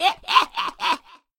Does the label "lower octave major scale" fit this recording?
No